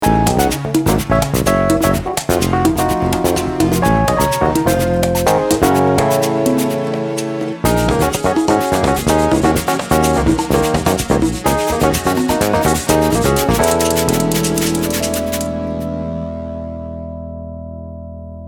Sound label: afox